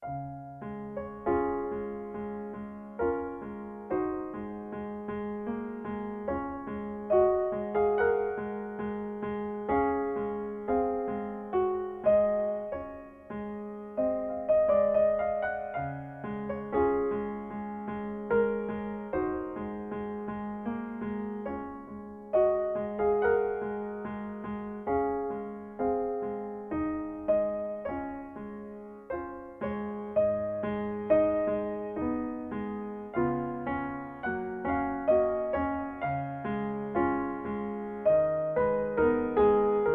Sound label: Raindrop Prelude